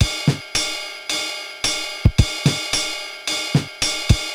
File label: Korg MR-16 (110BPM), beats